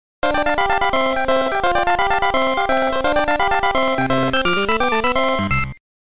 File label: victory dance; kirby dream land ost